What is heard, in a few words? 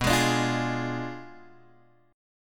B Diminished 7th B down Guitar Open G